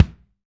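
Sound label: bass drum, Real Kit; 90 s Sample CDs; Sound & Vision - Gigapack I CD 1; Real-Kit s 4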